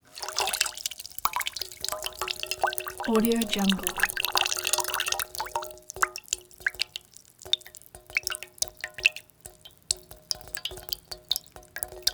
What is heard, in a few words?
water drips into half filled iron pan plops